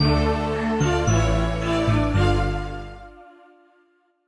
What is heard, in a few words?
Level Start; Bricks of Atlantis; macOS; Windows